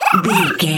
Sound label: goblin fairy attack special